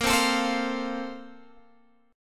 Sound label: Bb Major 7th Suspended 2nd, Guitar, Open G; 20,17,19,17,18,x